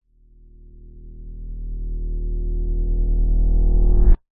riser single note low pitched reversed guitar pick; sound design